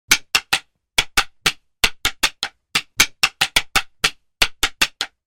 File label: Stick Fighting Routine; Hollywood Edge Martial Arts and Human Impacts